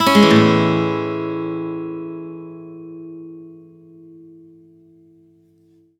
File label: One Shot Amaj7 upstroke, Acoustic Guitar; Sonic Foundry (Sony Creative Software); Songwriter Acoustic Guitar Companion